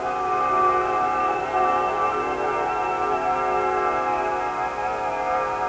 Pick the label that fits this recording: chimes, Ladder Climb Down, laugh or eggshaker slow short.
chimes